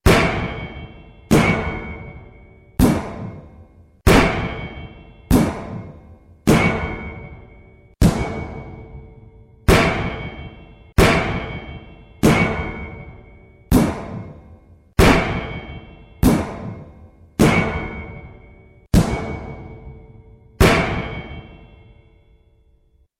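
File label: sound of a sledgehammer smashing something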